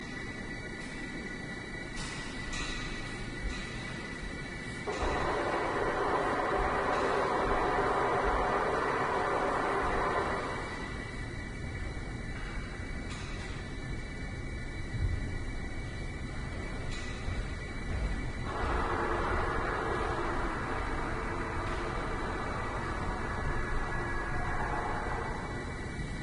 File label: Navigating Noise whirring haunted knocking